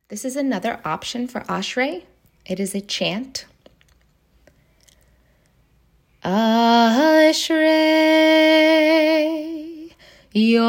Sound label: Ashrei chant